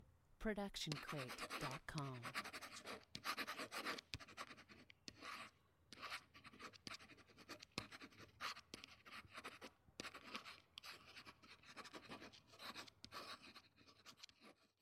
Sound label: pen scribble various; soundscrate